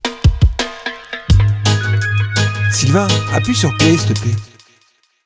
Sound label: jingle